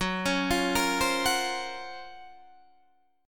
Gb Major Sharp 11th, Guitar DADGAD; GbM#11 arpeggiated

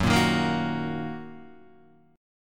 Fsharp 6th down Guitar Open D

